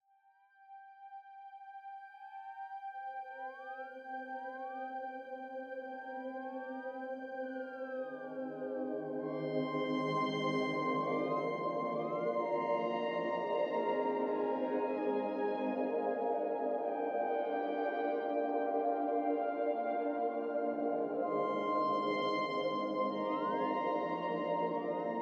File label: Exploration Fragment Synthy